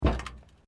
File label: Footsteps Boots Plate Metal Step; Footstep Foley; Footsteps (Sound Effects:Human)